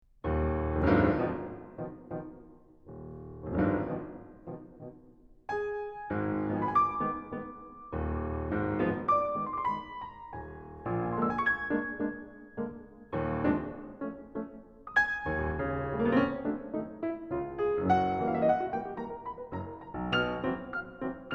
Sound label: tango alla turca